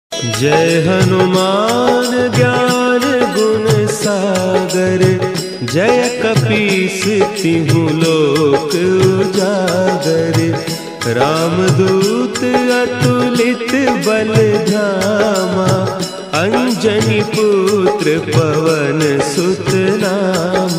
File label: hanuman chalisa ringtone bhakti ringtone